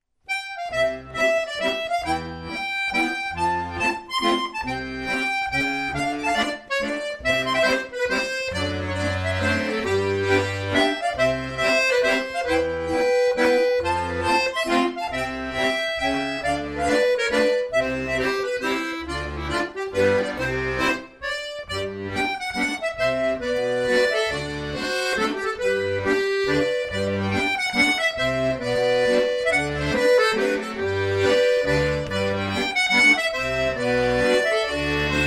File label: mazurka